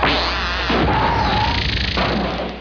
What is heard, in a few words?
dalek gun; dwho